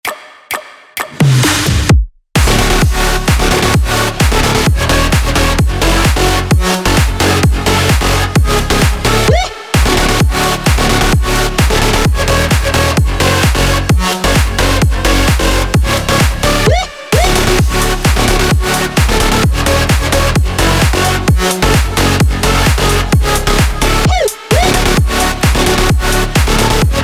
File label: future house